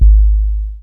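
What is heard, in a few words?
bass drum Korg SR120; Best Service Gigapack 2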